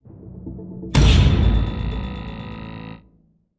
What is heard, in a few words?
sting Shock; the walking dead michonne 1 in too deep